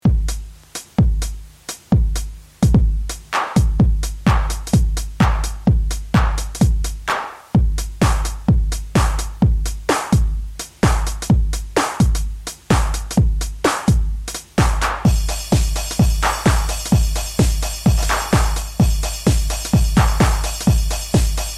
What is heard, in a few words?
VHS Degradation Suite, Electro Acoustic; Kontakt